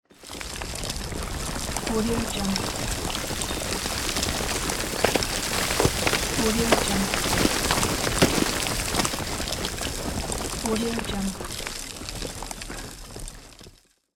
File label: ground dirt falling